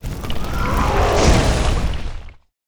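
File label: elemental attack, monster; lake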